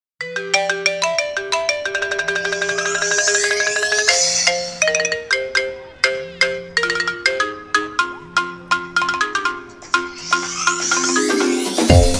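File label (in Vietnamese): nhạc chuông điện thoại iphone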